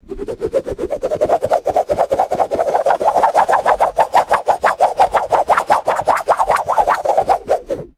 rhythmic airy whooshing rope spin; foley

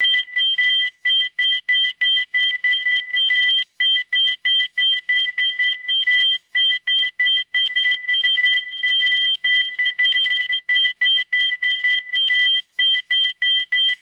bright repeating electronic tonal beeps

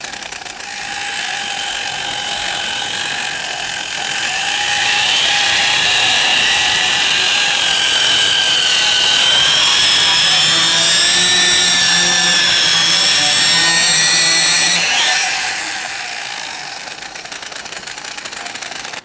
gas powered buzzsaw idling and revving

